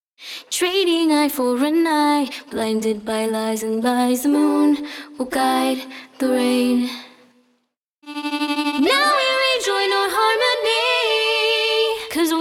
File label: vocal production